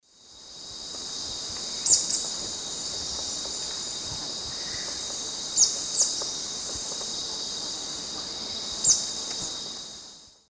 Cyanerpes caeruleus, call male; S. America; Eastern Lowlands

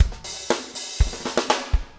4 on floor 120, loops, 120bpm

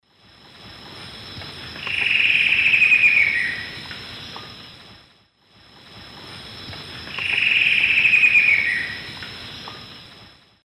Dendrocincla fuliginosa, song; S. America; Eastern Lowlands

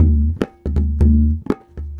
tabla 120

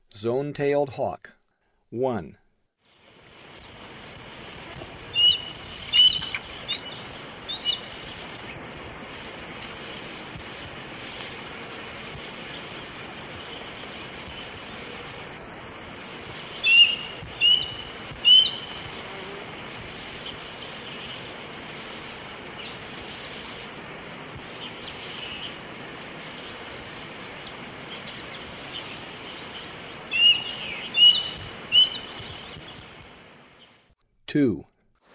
Zone-tailed Hawk, Birds of Ecuador; S. America

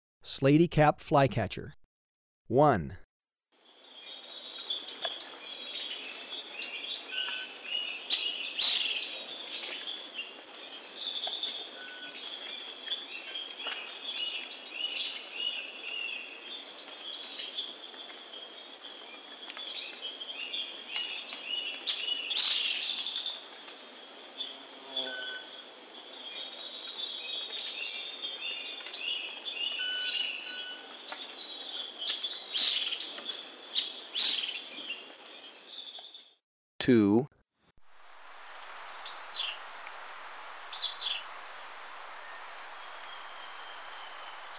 Slaty-Capped Flycatcher, Birds of Ecuador; S. America

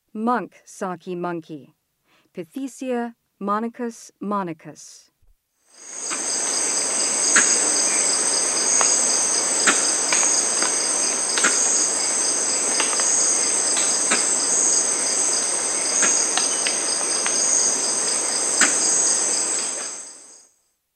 Monk saki monkey; S. America; Rainforest

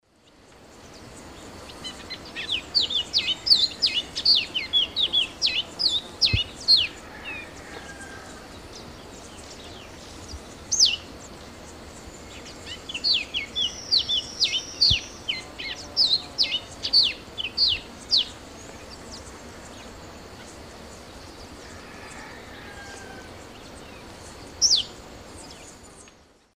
Sporophila castaneiventris, song and call; S. America; Eastern Lowlands

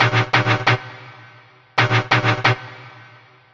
Synth, Techno

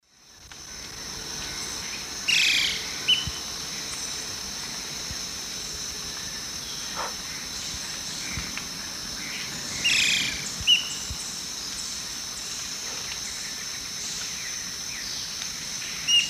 Lepidocolaptes albolineatus, calls and song; S. America; Eastern Lowlands

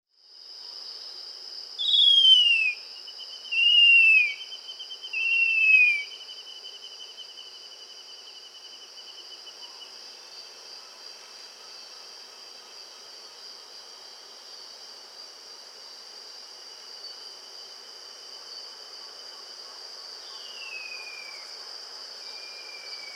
Song, Birds of the Costa Rican Cloud Forest

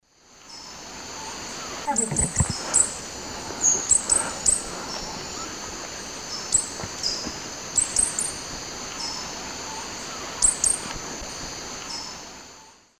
Tangara callophrys, call; S. America; Eastern Lowlands

